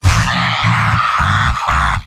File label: m laugh happy; heavy; tf2; garrysmod; mvm